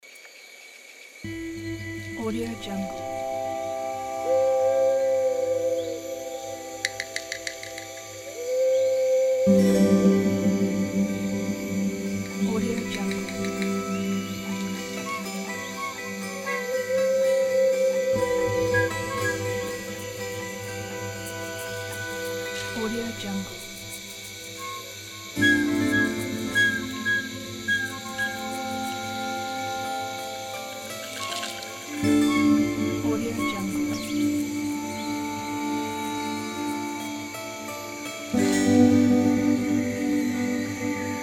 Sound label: magic forest